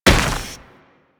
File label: Combined Step Dirt, Footsteps; Enemies; Warframe